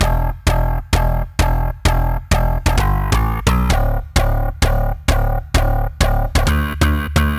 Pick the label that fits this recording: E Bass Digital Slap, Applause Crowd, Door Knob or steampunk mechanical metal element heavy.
E Bass Digital Slap